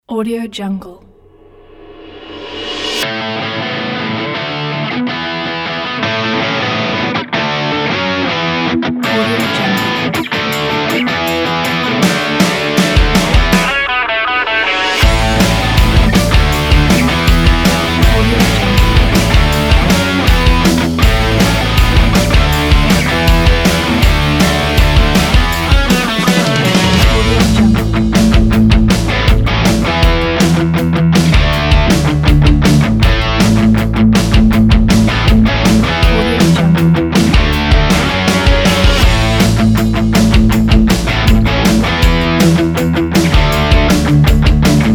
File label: pop punk upbeat